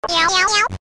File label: hot cat sound effect; Undertale